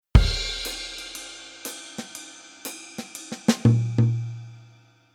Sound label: Drum Set Basic Drum Sample